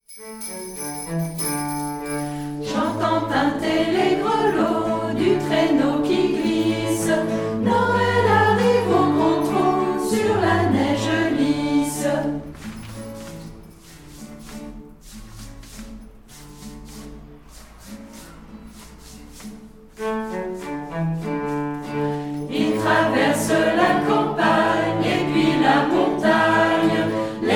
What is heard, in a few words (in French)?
les grelots de noel